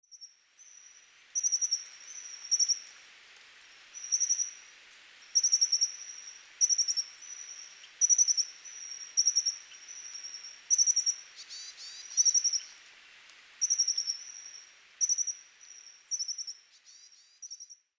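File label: Japanese Accentor; kayakuguri; chichibu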